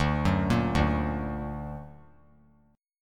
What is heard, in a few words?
D Augmented D down; D+ arpeggiated; JGuitar